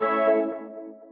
sudden warning

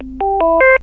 Radio power on

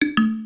error sound

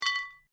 Drop, ringtones

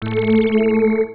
error sound

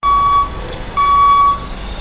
truck reversing

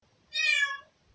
meauw

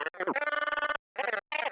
robot broken sound

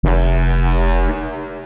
loud emergency

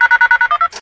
Ptt, radio effect

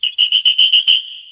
football referee

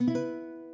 Second Tone

